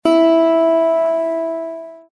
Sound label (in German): Zithermacher Ton 8 LAUTER